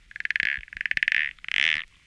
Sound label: small frog, frog groups, random animals